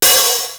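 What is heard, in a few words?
open hi hat; linn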